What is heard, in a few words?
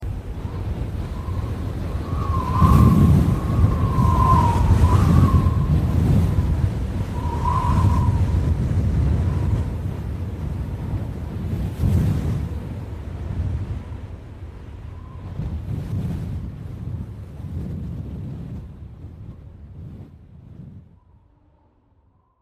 heavy wind